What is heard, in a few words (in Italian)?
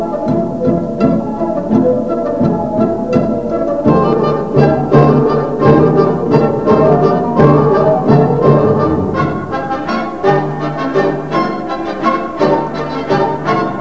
allegro vivace